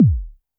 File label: korg trident